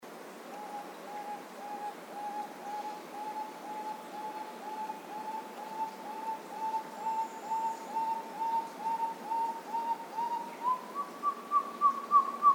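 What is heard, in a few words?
large-tailed nightjar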